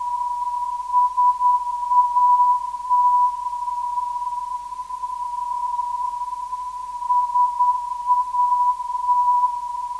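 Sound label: 365kHz, non-directional beacon; SAT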